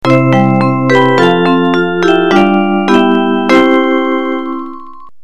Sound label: bell